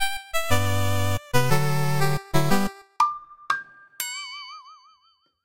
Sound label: Jingle Finale; Dandy Dungeon Legend of Brave Yamada